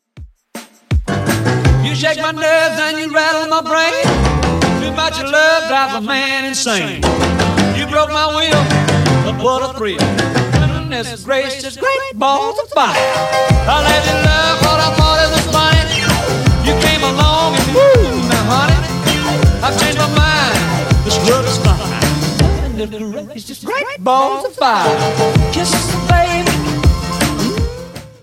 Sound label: 50s Redrum 162bpm